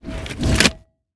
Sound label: acidtrap knife deploy